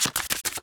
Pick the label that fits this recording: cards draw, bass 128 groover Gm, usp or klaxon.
cards draw